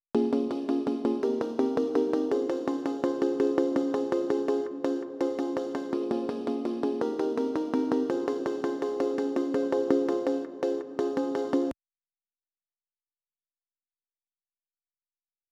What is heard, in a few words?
166 BPM G# Maj Pluck, melody loop stem; Loop Stems; Cymatics; 2022 Melody Collection - Wavy; Foreign